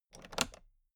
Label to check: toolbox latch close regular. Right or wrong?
Right